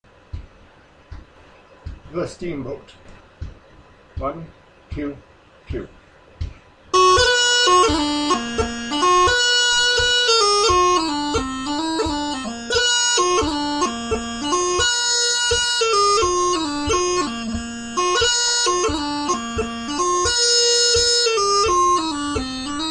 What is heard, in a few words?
PC, bagpipes; The Steamboat; bonnie dundee